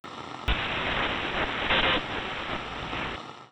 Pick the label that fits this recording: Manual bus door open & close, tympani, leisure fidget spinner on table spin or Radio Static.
Radio Static